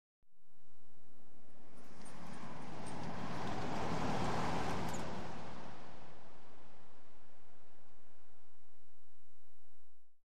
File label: Car Exterior Slow By